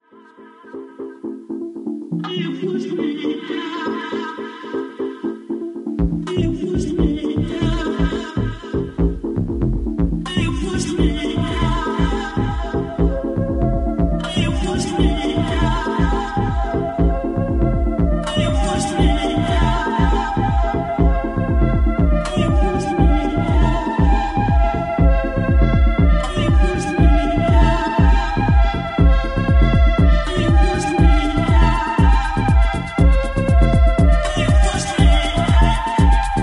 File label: two bar house music and chrod stuff